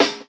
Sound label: compsd, DRUMS SINGLE HITS, Snares